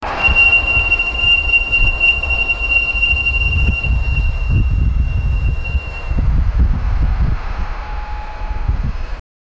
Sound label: a boatswains whistle to a, nautical